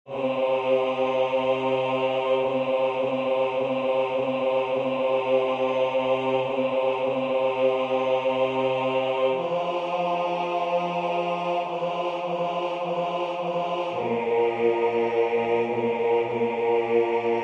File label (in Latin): Cor Jesu sacratissimum basse; Gauthier